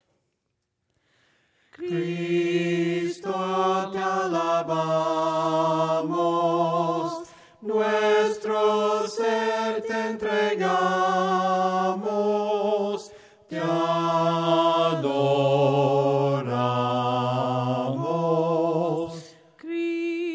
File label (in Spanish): CRISTO TE ALABAMOS VOCES, himnos a capella; cantos del camino